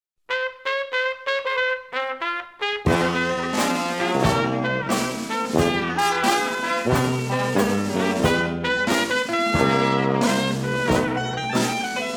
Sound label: blues; St James infirmary blues; Sonora vol A